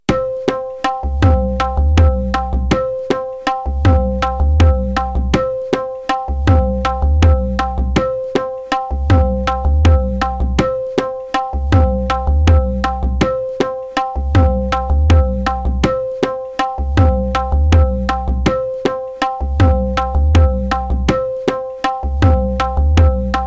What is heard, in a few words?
rupak drut 160bpm